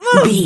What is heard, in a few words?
voice female hurt pain